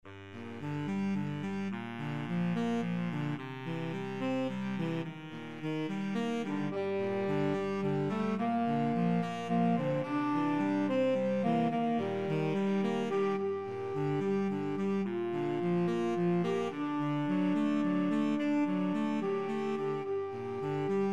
house of the rising sun, flexible mixed ensemble 3 players